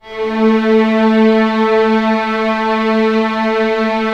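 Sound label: strings A2 FF; Sound On Sound - Peter Siedleczek's Orchestra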